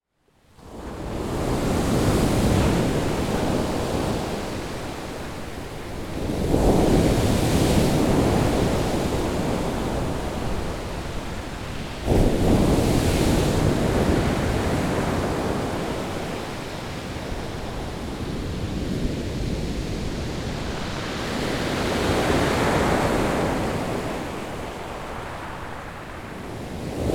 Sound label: Incoming Tide Norfolk; wild soundscape